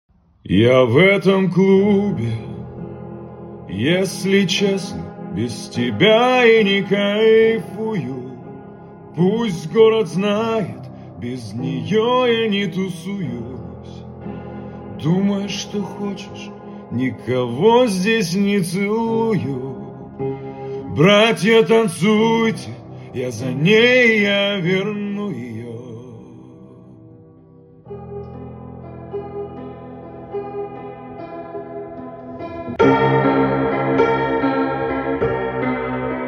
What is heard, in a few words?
dark piano edition